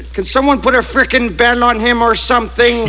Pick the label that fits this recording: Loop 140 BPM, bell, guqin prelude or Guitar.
bell